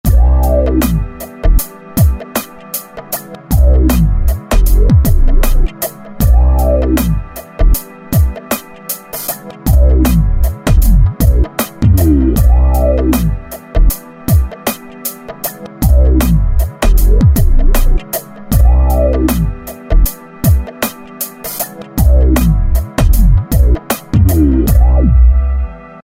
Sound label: R&B Vibe; Korg ES-1; Factory Patterns